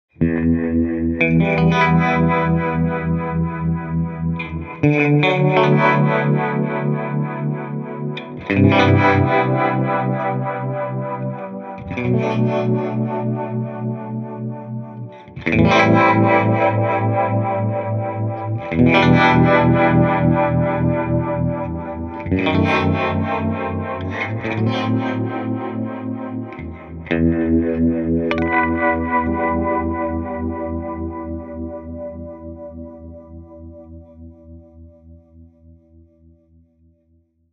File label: underwater; malibu